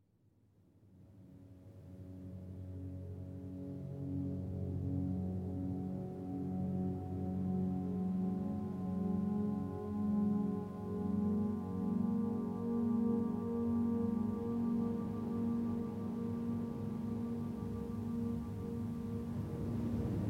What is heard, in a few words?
Contemporary Meditation